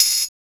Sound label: CYBER KIT, DRUMS